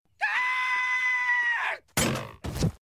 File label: aagggghhh